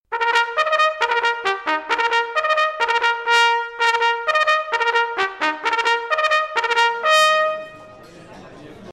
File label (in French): Sonnerie A La Soupe